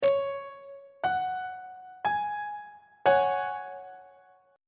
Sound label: g flat suspended 2nd 2nd inversion on treble clef